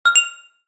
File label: correct